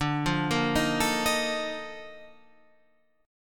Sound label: A sharp Major 11th D